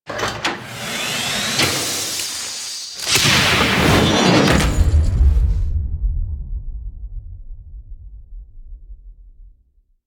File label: Land; Eidolon Plains; Cetus Intro; Cinematic SFX